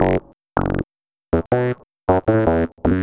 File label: Squibble, Bass; Sonic Foundry (Sony Creative Software); Synchro Funk